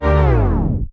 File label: Tape Stop